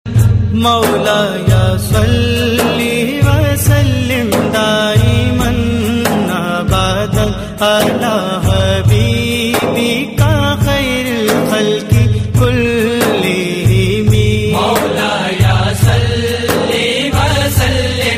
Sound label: Islamic Ringtone